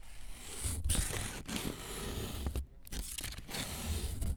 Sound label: Smooth Texture; BB Retail Therapy Sample Pack